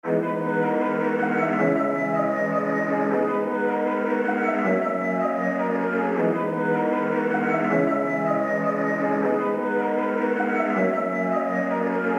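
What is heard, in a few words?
G minor 157 BPM Pad Lead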